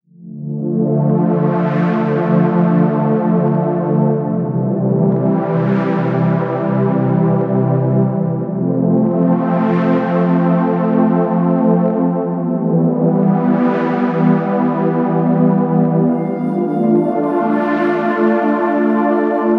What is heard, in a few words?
music